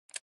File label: chip